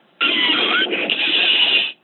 cry; pokemon x y; heatran

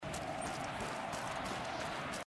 walking through mud; rise of the tomb raider; lara

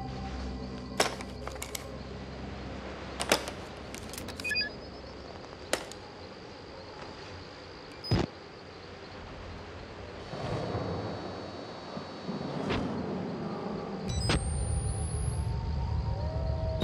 objects camera

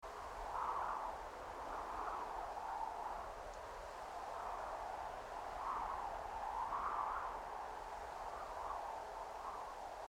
ambient nature; firewatch

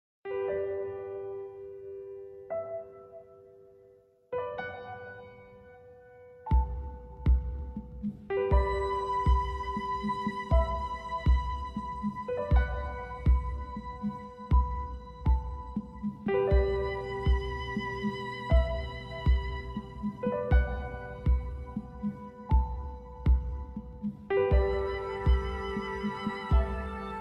soundtrack sample

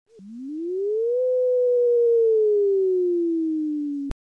howl; final fantasy vii; red xiii

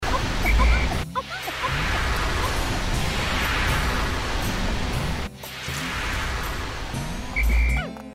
flames; gamesoundopedia

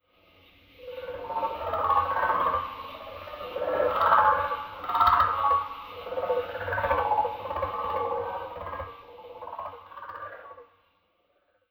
vaccuum toucans; battlefront 2 2017

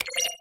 ui buttons

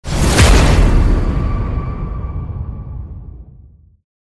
critical hit; elden ring

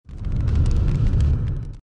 fire trap

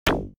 sfx confirm submenu; Duck Game

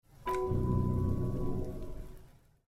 physique skill theme Klang

